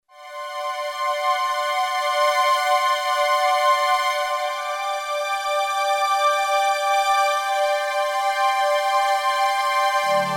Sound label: CS-Strings-80, Roland JD-990; Vintage Board